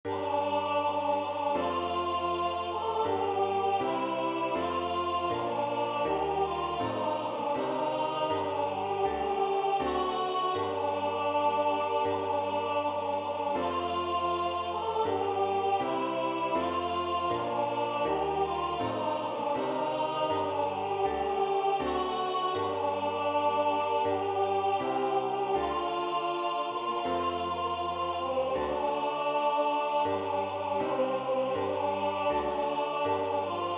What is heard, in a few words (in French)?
Qui veut chasser une migraine alti